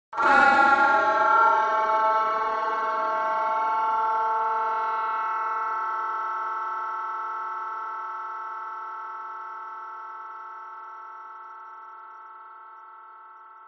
sound design hit long designed stretched whining droning